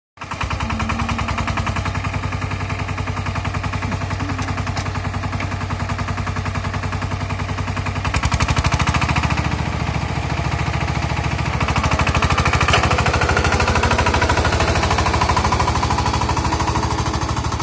Agricultural tiller

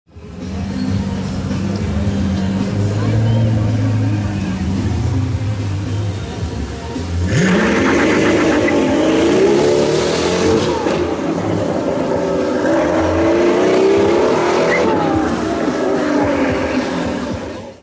pagani huayra; اگزوز

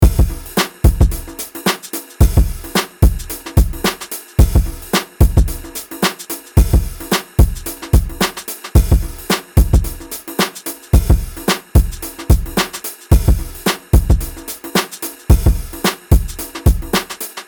Kick Drums; کیک درام

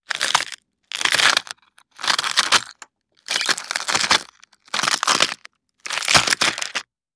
Egg Hatch Crunch

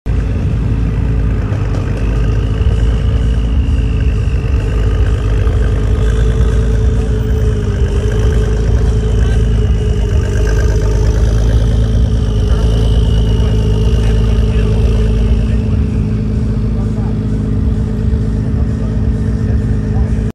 acura, ماشین آکورا

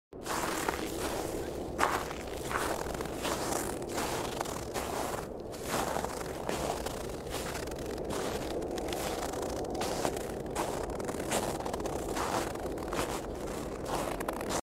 walking in snow; راه رفتن در برف

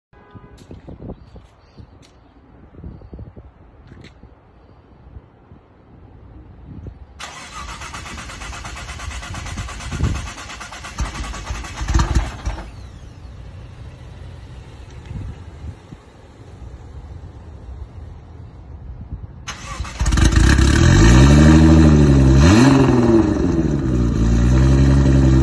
nissan gt r; اگزوز

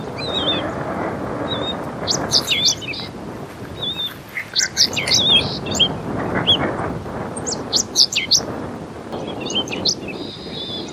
correndera pipit

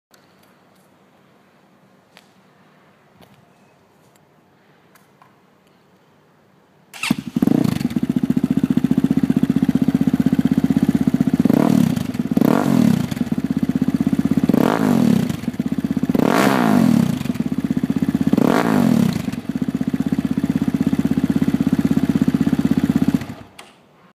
motorcycle; موتور مگلی

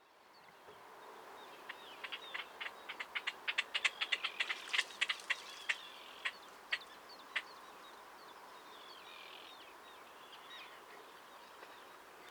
Red necked grebe; كشیم گردن سرخ

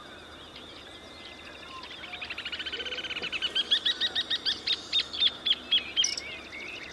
rufous hornero

